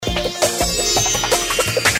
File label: Galaxy Note Sms